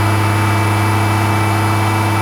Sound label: Dump Truck Engine Loop; Trucks